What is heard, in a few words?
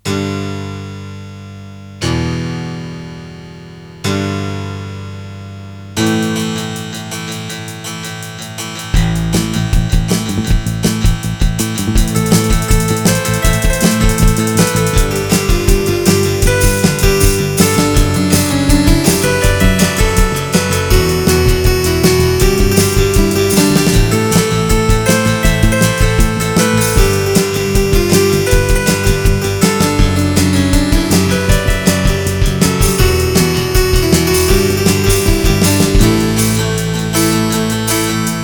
Keyboard